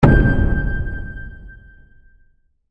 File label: koto; League of Legends; lol air client